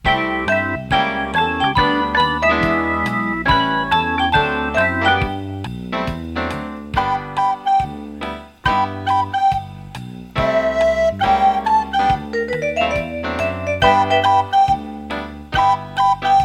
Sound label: Swing